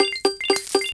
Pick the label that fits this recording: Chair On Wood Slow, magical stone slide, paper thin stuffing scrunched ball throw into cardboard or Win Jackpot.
Win Jackpot